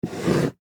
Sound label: Household Jewelry Box Drawer Open; Miscellaneous Household